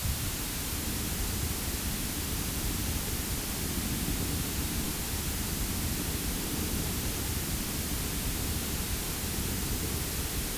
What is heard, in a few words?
drifting